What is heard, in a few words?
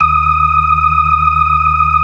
BLOOD OCT R, ORGAN; Keith Emerson Signature Series